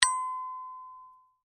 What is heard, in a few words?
Chime Bell Ring, Music, Chime, Bell, Ring, Muted; Bells; Musical; Blast Wave